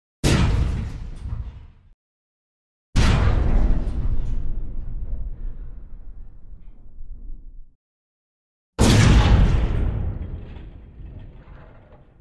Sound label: Impacts & Rattles Heavy Large Metal Impact With Long; Hollywood Edge Foley Sound Library